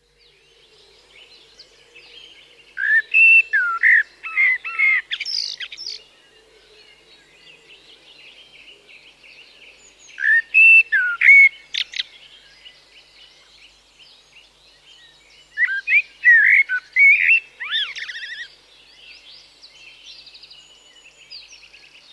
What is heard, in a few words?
Blackbird, with dawn woodland atmosphere of song thrush, woodpigeon and jackdaw in background; Environmental Sound Effects; BBC